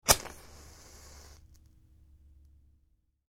Match, Strike; Flames; Flame Sound Effects; Flames (Sound Effects:Objects); Stuart Duffield

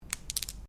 Dice Medium Pair Shake Hands

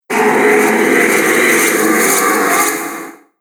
Scifi Whoosh; Whoosh Sound Effects

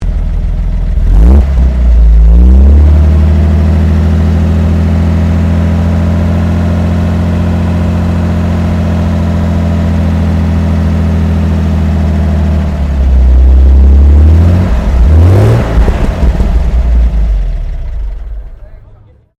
Truck Bedford WS 1942 rev shutdown exhaust; Classic commercial vehicles; Trucks

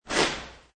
Bathroom Towel Tear

